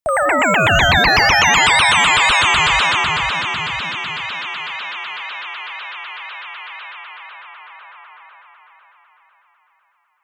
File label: atonal rhythm riser short